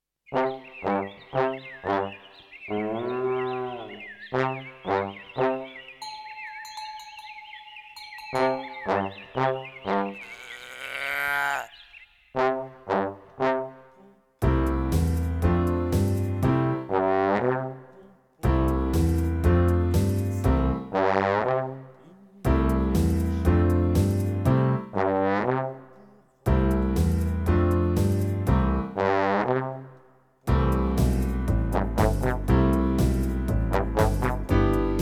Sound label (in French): Accompagnement